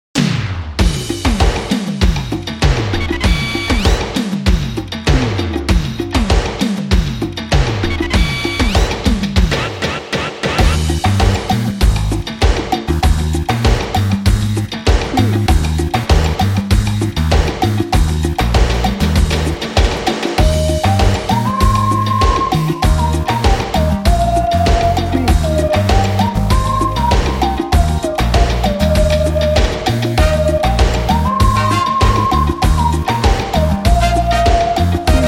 tropical party

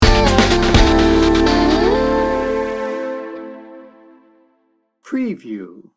trance dance sting bumper

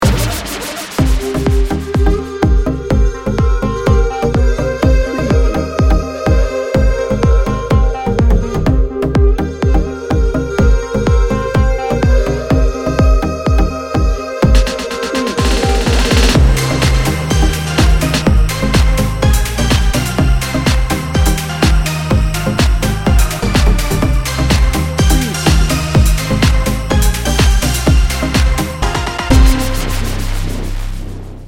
dance party edm

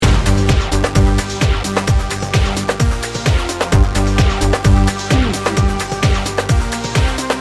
loop

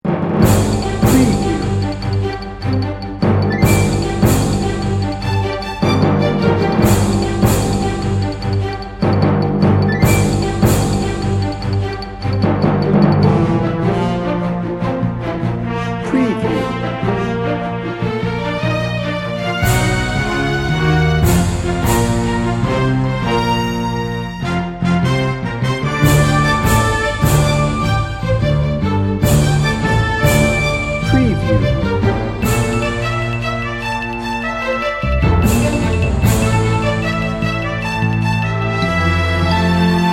anchor news less drums

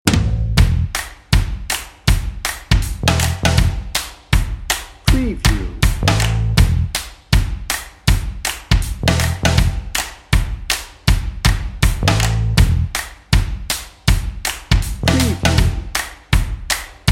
beer party dnb

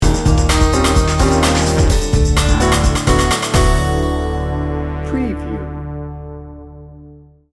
busy beats sting bumper

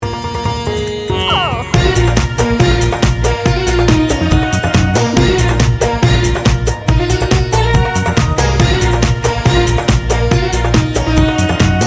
bollywood bash

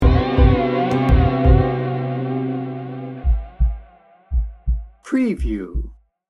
swampy tension sting bumper

